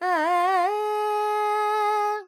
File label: Dorian, Vocals Carnatic Female Alaps 70 G Dry